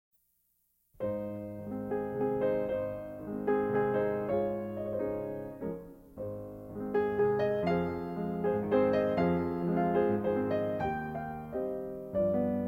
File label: waltz; Brahms